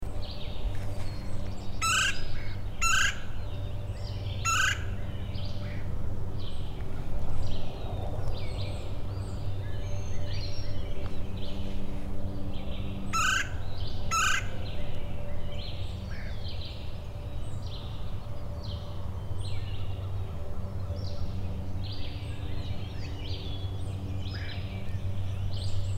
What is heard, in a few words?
Red-Bellied Woodpecker